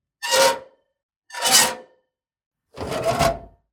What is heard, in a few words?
Just Metal Squeaks and Moans; soundbits